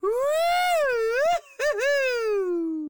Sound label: Man Woohoo; Zomboid; Lifestyle